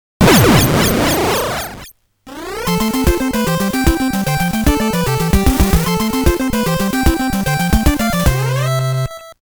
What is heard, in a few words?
GAME OVER, Game Over